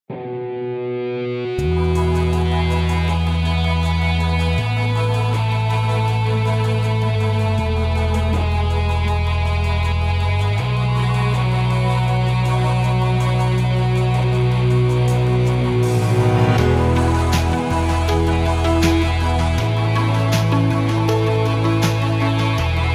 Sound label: Contemporary Track